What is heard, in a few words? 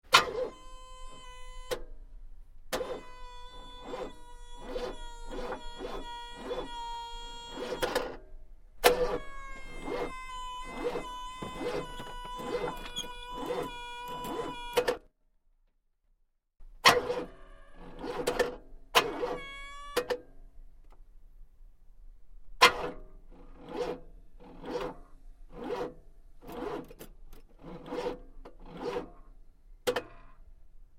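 car starter sounds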